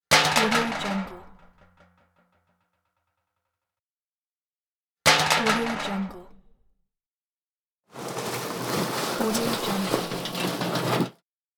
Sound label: trash can